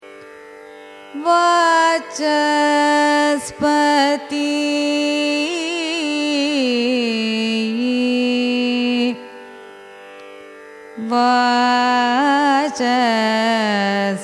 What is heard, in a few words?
raga vachaspati signature, carnatic music